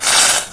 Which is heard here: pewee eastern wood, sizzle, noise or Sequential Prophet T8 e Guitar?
sizzle